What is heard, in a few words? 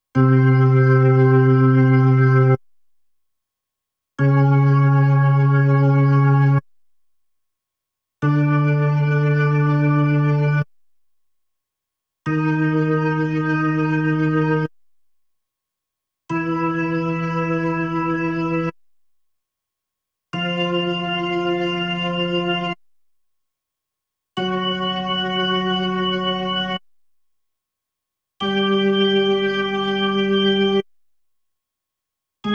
B3 Organ; Masterbits CLIMAX 8